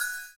CYM, Ethno Techno; ILIO - Ethno Techno

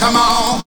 VOCALS; Ueberschall; Jam Box

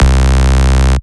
Basses Root C

